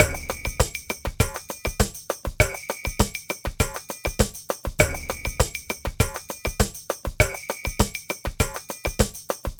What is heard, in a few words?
Ensemble, Latin Percussion